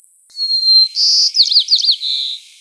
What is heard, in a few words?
song, bird songs of WA; wcsp